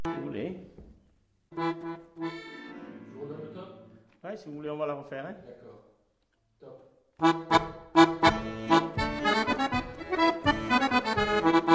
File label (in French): bourrée